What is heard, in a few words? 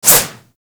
Boomerang Whoosh; Warframe; Weapons